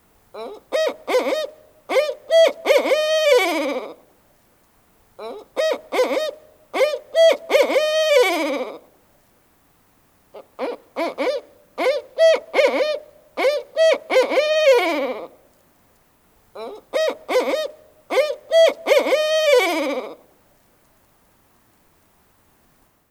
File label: BARRED OWL